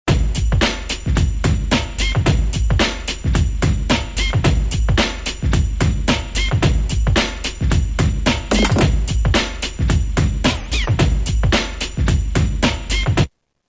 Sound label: Squeeky 110; Roland M-DC1